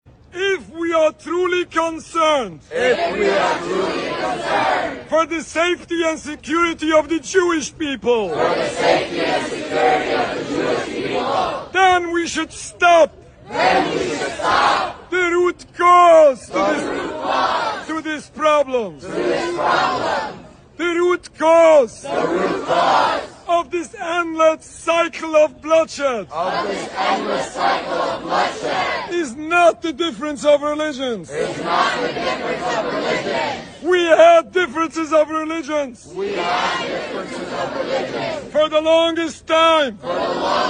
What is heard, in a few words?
Rabbi leads anti-Zionist chant; Gaza